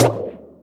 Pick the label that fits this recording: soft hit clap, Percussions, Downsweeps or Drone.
Percussions